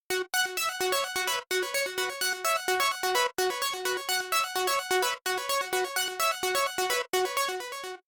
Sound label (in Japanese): デモ曲（シーケンス）